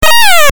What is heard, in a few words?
meow